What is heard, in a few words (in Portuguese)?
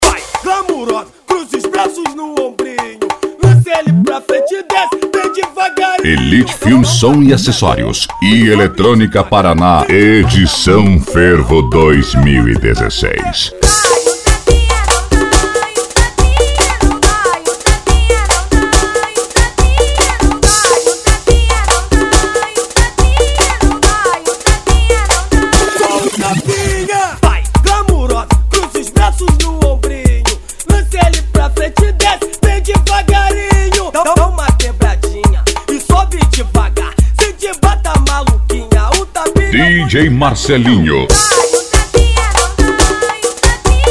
eletronica; parana edicao fervo 2016